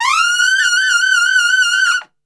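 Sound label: F# trumpet shake; Best Service; Brass Super Section; TRP SHAKE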